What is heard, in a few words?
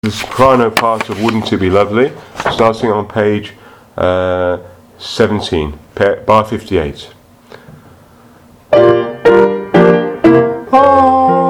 soprano; My Fair Lady